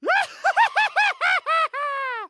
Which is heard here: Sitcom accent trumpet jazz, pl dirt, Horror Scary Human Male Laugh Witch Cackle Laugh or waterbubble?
Horror Scary Human Male Laugh Witch Cackle Laugh